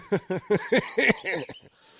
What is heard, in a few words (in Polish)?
śmiech